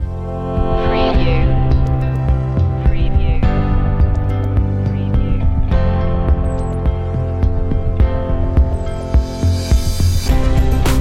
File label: best motivational song